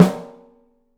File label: OPEN STEEL SN; 3D Drums